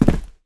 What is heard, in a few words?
jump land; landing sound; GoakeFlax; movement refactor, airstepping, landing sound